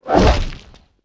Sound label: fist woosh